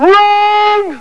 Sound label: Wrong!; Catch Seven